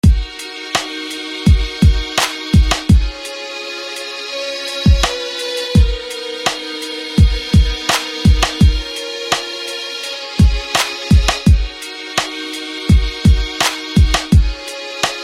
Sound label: Violin; SFB FX